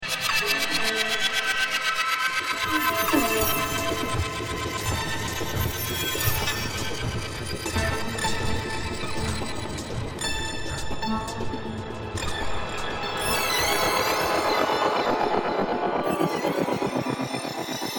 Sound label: Alien Hive; Alien Sound Effects